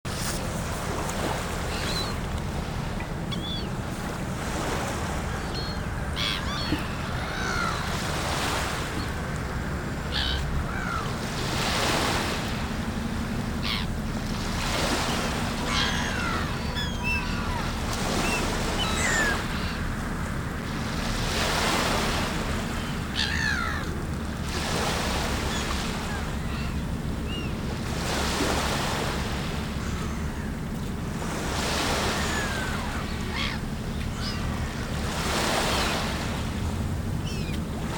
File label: besiktas shore morning soundscape